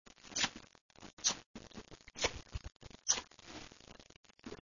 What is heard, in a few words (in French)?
texture bise